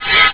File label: flash